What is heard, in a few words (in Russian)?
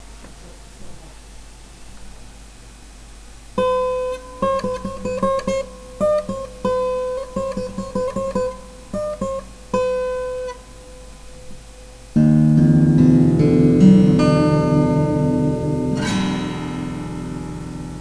sound, Играем на гитаре